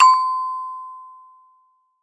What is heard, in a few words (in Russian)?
lift priehal din